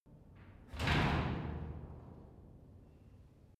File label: garage door close short soft